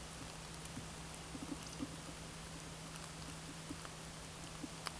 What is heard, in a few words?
Scolopax rusticola